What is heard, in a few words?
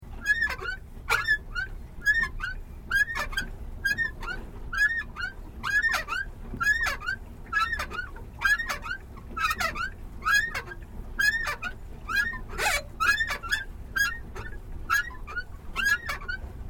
Boat Pedal Operation Squeaky Loop; Various private boats; Boats (Sound Effects:Vehicles)